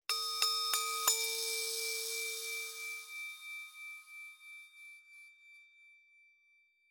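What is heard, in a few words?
ambience China 3D ambience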